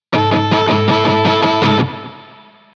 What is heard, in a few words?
brushing miss